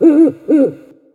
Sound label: owl, outdoor ambient